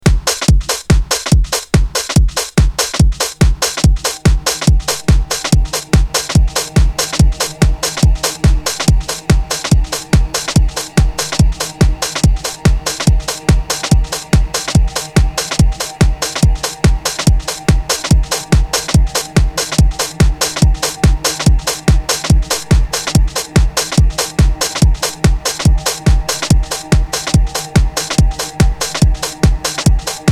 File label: Electro; Beds Loops; RoosterFX